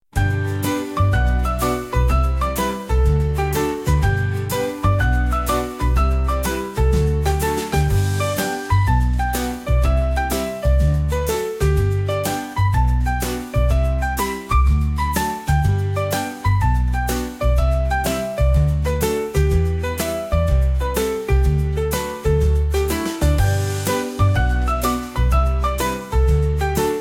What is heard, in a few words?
merry christmas christmas dream